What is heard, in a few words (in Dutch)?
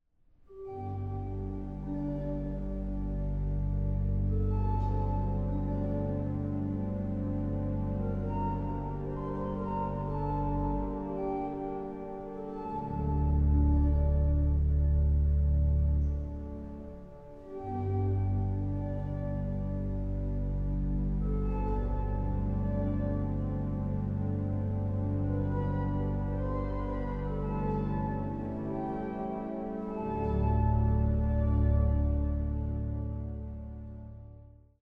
Morgenzang Psalm En Avondzang